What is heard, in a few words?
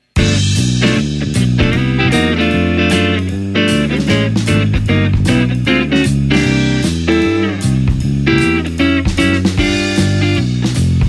swing chord top line